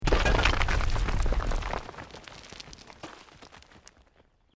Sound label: ROCK DIRT SLIDE SMALL; Created dramatic effects of buildings collapsing or breaking; Rocks; Materials